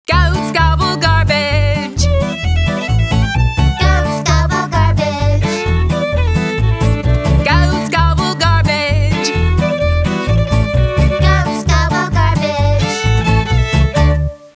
Song Letters g G S O N G; School; Fisher Price Ready for School Kindergarten

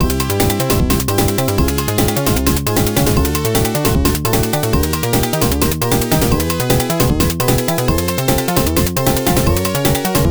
Warning; puyo puyo tsuu; genesis

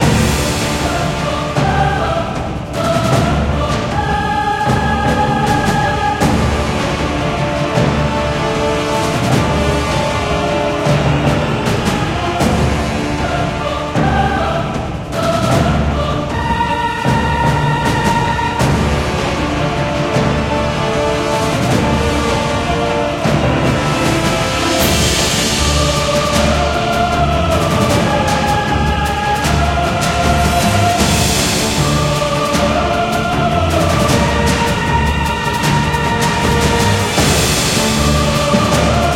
music fight; naruto ultimate storm android ios gamerip 2016; jinsemingren music level4 fight

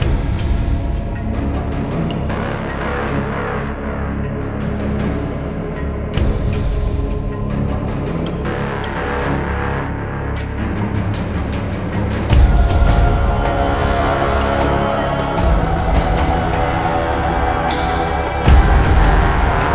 Ice Bossbattle Shorter

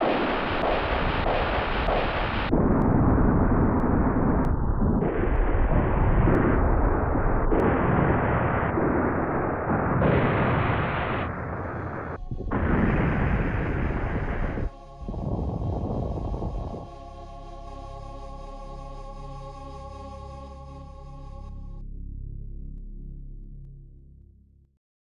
Splash; Mazinger Z; Ghoul

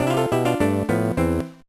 Unknown Jingle; The Berenstain Bears and the Spooky Old Tree; GBA

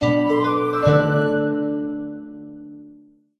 Lottery Win; Kingdoms & Lords

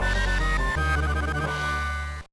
Door Opened; prince of persia the sands of time 2003 gba

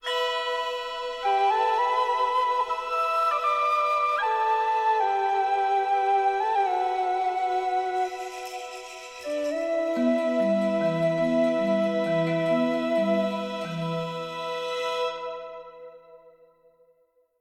Music Stem; Level 15

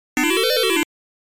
Extending Sound

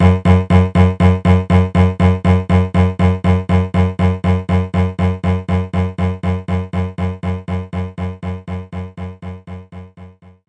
Cello Attack; knights try

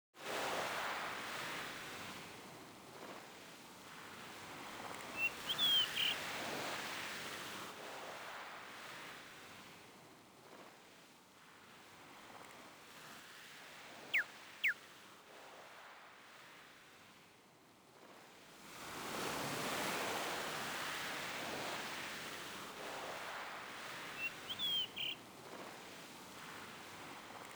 Crashing Waves; Picross 3D; NDS

